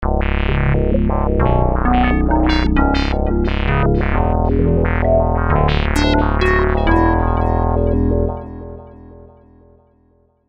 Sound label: SYN SQUARE; eShop